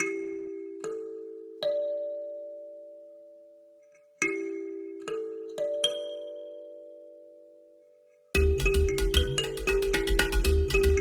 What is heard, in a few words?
AcousticIndia